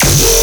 Bass Hit E, Dubstep

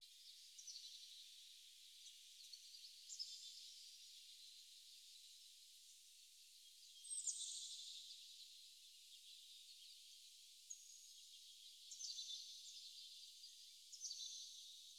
128 BPM G# Min Ambience; House; Loop Stems; Cymatics; Breakdown Loops; Jungle

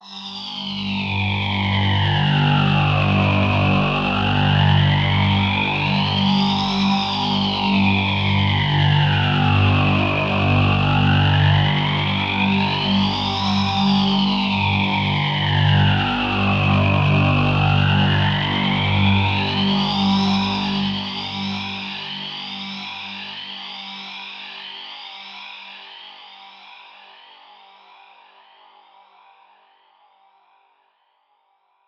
Atmosphere G 142 BPM, psytrance; Nineufar

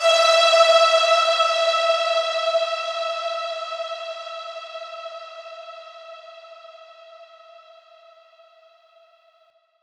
Riddim Melodic Hit - E Cicadas; One-Shots; Ghosthack; Riddim Essentials Volume 2

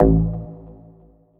Bass Shot A

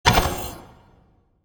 Attack Magic Arrow; Game Essentials

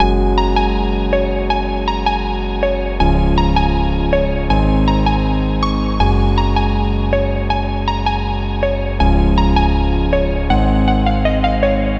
Melody Loop, 160 BPM C# Maj; Synth Loops; Cymatics; Light Free Sample Pack; Bonus Samples

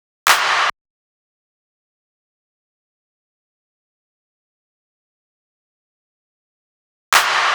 Drum Loop - 140 BPM Clap; Footwork; Experimental Drum Loops; Cymatics; Mystery Pack Vol 4

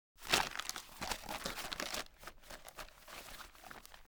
Potato Chip Bag Movement; Fairlight Sound Lib

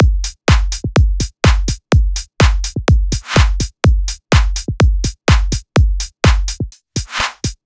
Slap House Kit 125bpm Full, drums; EDM Drums; Drum Kits; Stems; Ghosthack; Ultimate EDM Drums; Slap House Kit 14

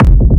Techno Kick; 30 Techno Kicks 2025; Ghosthack

